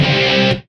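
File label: guitar chord (C); Single Shots; Misc; Sonic Foundry (Sony Creative Software); Ugly Remnants VOL-2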